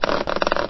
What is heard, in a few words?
plastic barrel strain; physics